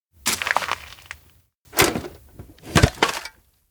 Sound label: candy tin open; anemoi